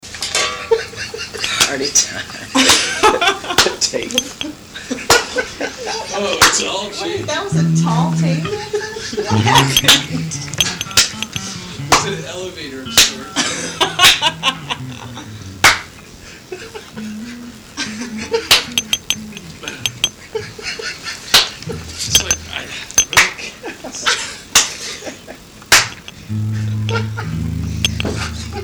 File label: so much laughter